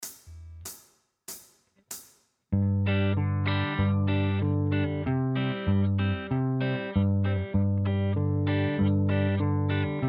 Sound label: Backing Track; bluegrass guitar mastery